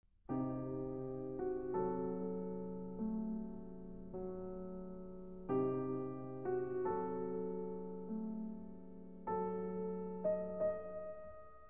Piano Sonata No. 26 in E-Flat Major, Op. 81a II. Abwesenheit